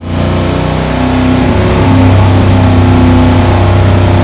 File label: fourth cruise, vehicles